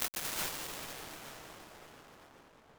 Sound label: Endless Tunnel, Impulses